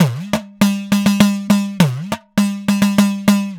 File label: 3 4 Pattern